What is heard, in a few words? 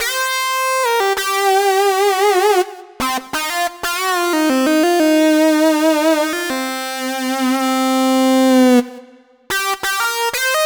Lead Synth Lick; Dr. Fink's Funk Factory